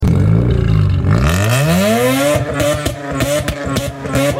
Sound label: sudden rotor 2 misfire sounds like motorcycle no power, rx8